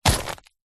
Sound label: purse pouch leather containing coins and notes grab pick up; zapsplat; foley